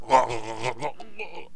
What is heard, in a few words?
drown